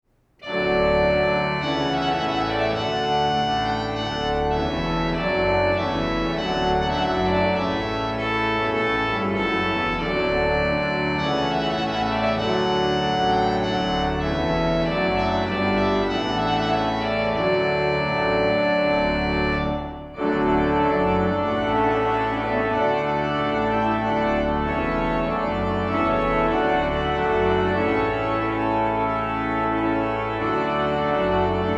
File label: Trumpet Voluntary; Clark; wedding processions